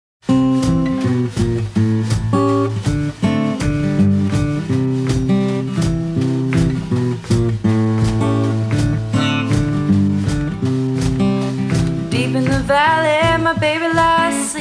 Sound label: Ragtime